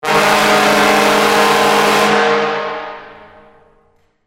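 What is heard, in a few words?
Sting Large Brass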